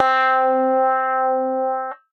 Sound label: Tempo Wah Clav; Piano & Keys; Ableton; Factory Packs; Chop and Swing